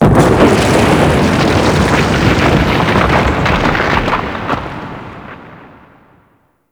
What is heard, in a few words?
Rock Sucker B, exterior ambience; Warframe; RailJack; Pluto Charon Region